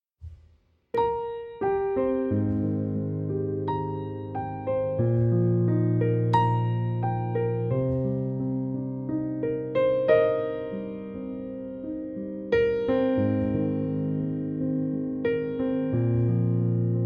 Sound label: Piano Version